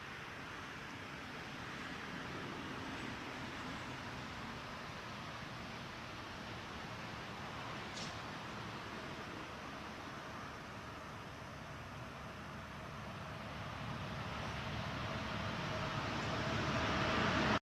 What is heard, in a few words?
woodpeckers; Hi8